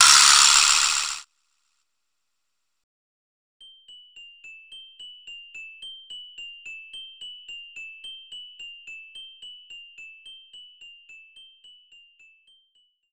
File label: Death BGM; Yoshi's Story; Nintendo 64